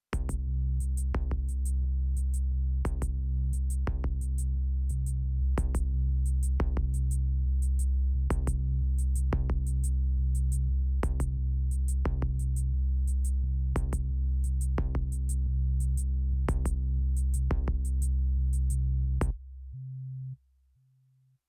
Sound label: Fredenstein COMP SE Attack